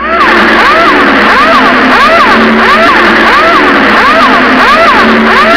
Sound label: airgun